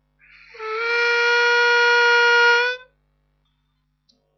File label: bend